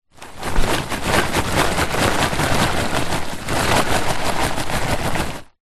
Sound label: popcorn paper bag shake open full